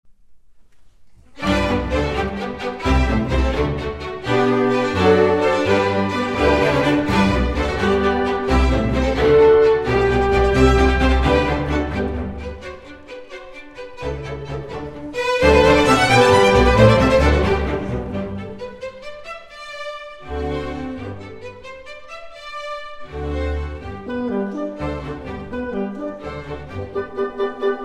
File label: Concerto in G for Violin and Orchestra; Henkel; Concerto No. 3